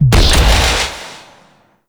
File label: Charged Shot; Weapons; Warframe; Tenno; Wraith Sidearm; Epitaph Prime